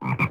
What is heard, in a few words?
Frog Croak; the princess and the frog; Restaurant